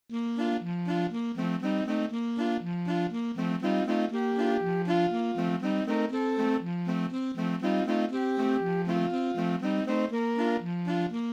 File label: Happy Birthday SSAA